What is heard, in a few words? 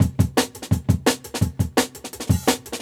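Jungle Breaks, 170 bpm; Nok; Original Jungle Breaks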